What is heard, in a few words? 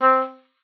OBOE C4; Xsample VOL-6